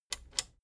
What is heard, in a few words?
plastic button small latch click disable slow